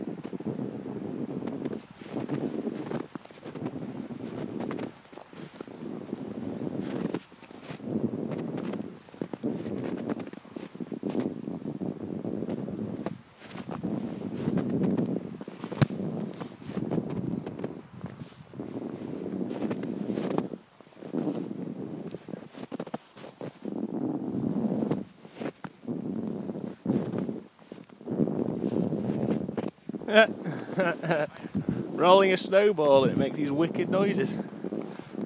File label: noisy snowball rolling